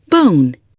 BONE

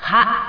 GOBLIN; GoD

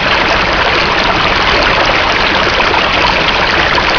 stream; PC Gamer IT CD 41; Daikatana

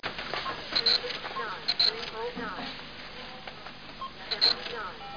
CASHREG; Image Bonanza; Expert Software; ondajob

